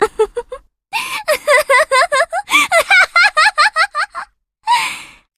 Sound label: laugh